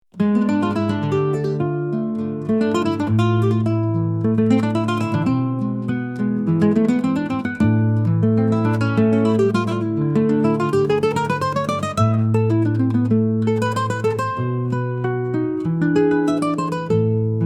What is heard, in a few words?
spanish guitar beauty melody